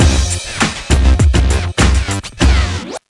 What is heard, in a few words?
Hiphop Fin; ener g dance squad imagine modern dancer 2008 nintendo ds gamerip